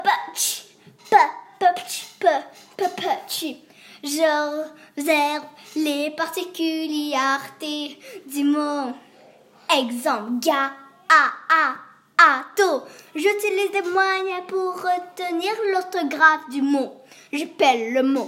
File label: Rap